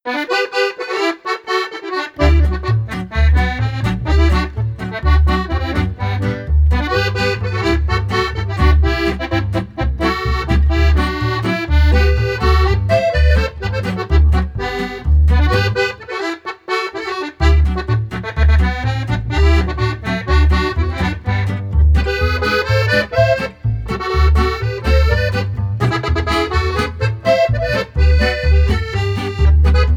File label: Polka Band; Philadelphia